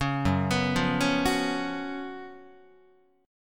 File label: F sharp Major 7th Suspended 4th, Guitar; F#M7sus4 arpeggiated; 4,2,2,3,5,5